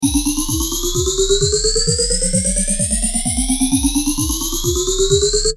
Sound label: drumloop; boss ph3